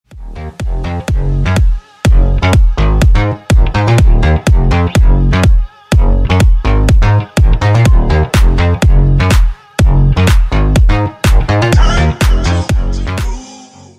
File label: Guitar Remix